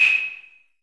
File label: LR CL808, TR808